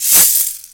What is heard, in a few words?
RAINSTICK; USB Soundscan vol.27